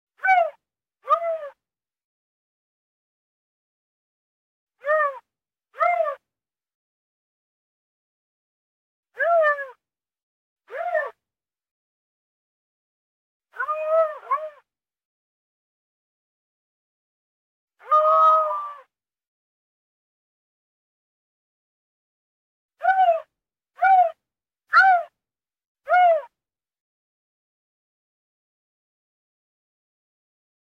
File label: Bobcat in heat call